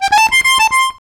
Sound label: Accordion Lik F; Zero-G Kocktail Kollection; Accordion Lix